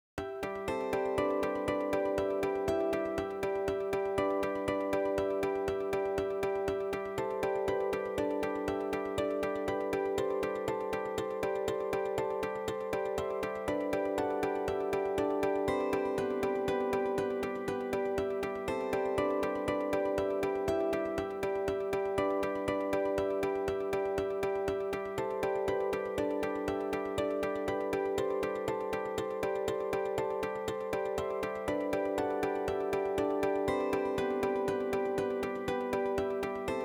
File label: musette harmonix; musicBox